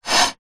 foley glass pane small scrape on wood surface short